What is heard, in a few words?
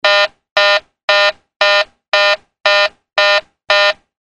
Take off warning